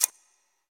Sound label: click; Minecraft; Conquest Default